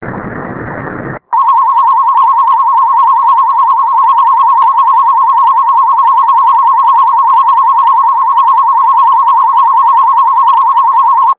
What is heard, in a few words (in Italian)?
disturbo, beeps